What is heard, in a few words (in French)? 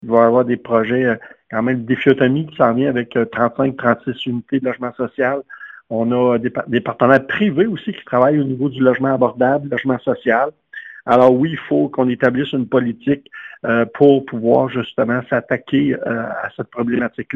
bourdon